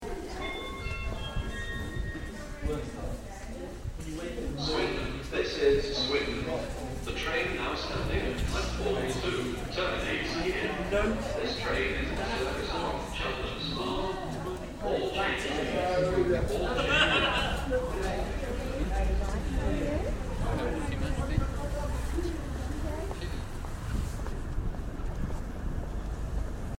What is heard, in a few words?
leaving swindon station